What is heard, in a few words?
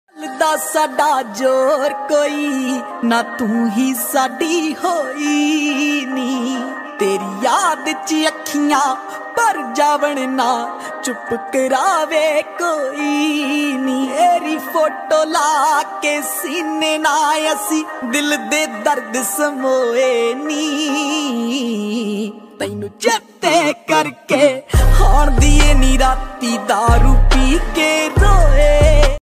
Dance Punjabi Ringtone